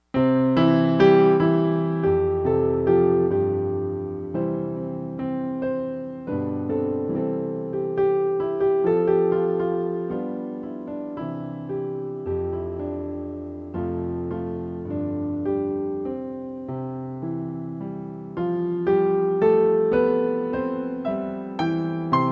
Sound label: piano; beggartick